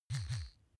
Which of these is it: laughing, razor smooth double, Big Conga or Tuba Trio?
razor smooth double